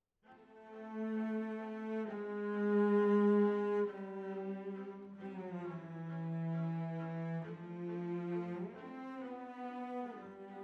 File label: Cellos